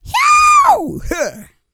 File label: E YELL, MALE HUMAN; R&B & Dance; ILIO; Vocal Planet